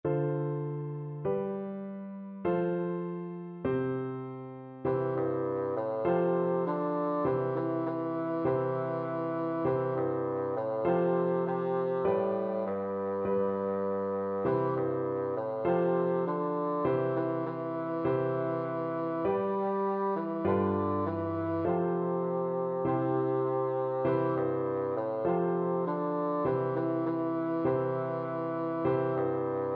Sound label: nobody knows, bassoon